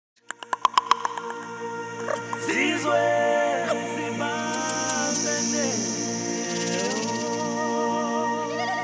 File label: Youth Choir